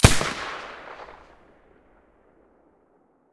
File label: silencer mp9 stereo, weapons